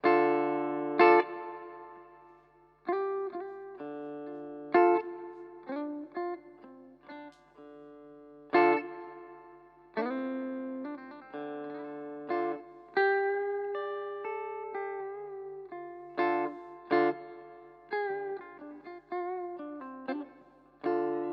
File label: Clean Bridge; ESP LTD Deluxe MH 1000